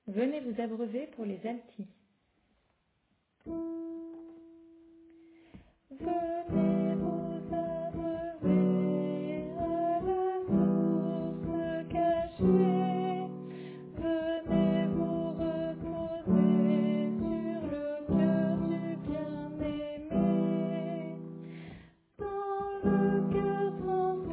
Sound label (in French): Alto